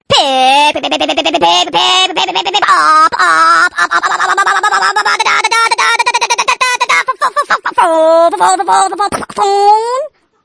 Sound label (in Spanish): Rana Loca; graciosos